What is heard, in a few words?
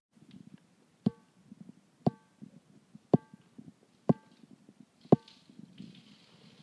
Metallic Sound